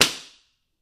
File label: Book Slap Good Hit